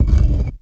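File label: door open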